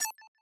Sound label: ui prepare countdown